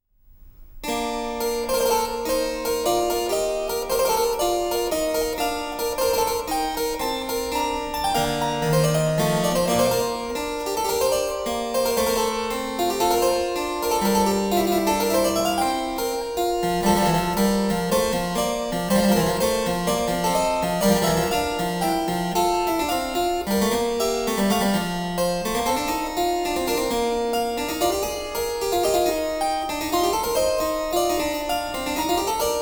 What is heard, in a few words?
Harpsichord; Blandine Verlet; Bach; Complete Partitas for Harpsichord; 巴赫羽管键琴组曲全集